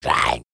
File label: Goblin attack quick